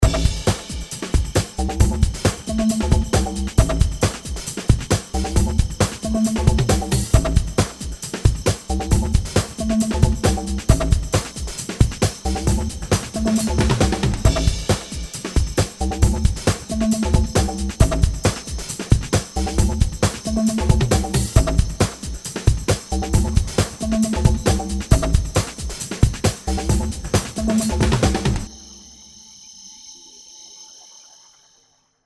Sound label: Bubble Night Time